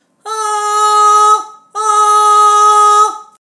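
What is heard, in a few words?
middle voice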